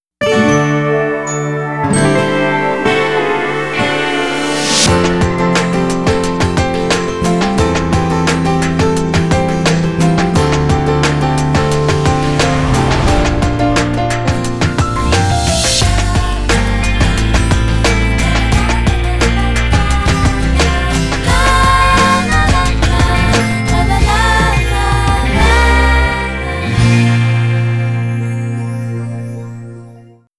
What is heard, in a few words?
music only